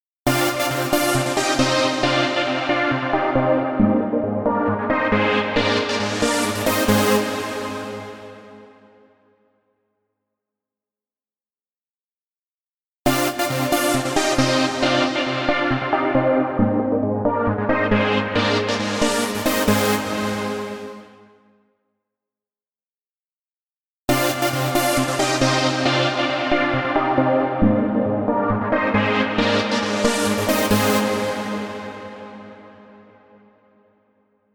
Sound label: trance saw wet variations